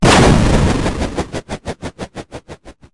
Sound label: thunder copter